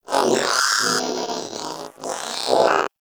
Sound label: Zanuka Companion Vocal Breath Walk; Pets; Warframe